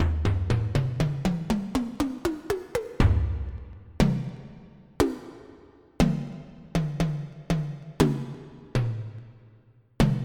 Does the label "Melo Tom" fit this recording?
Yes